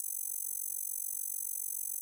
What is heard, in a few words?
rapid digital text computing